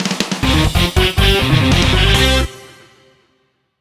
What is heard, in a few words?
Battle Fanfare; Spectrobes: Origins; Wii